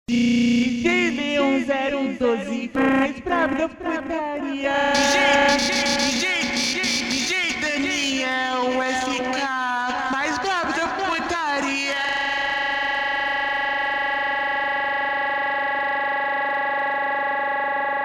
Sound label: funk, SLowed